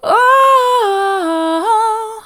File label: ooh mid, vocal